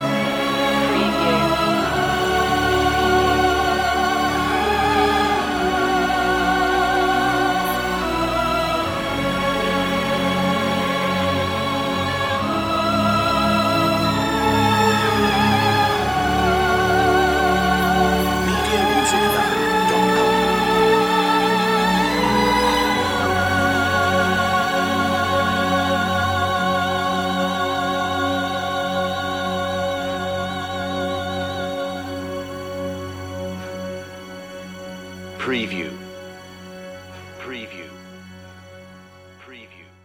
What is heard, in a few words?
orch and choir